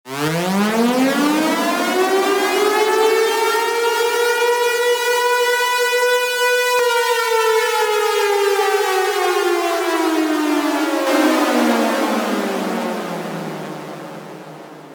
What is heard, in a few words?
sirene